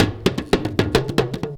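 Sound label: big tama groove; Best Service; World Colours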